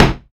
raw steel snare drum sample f key, steel snare drum sound tuned to the F; snare-drum-samples